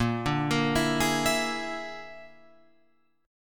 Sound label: A sharp 7th Flat 5th A sharp down Guitar Open F; A#7b5 arpeggiated; JGuitar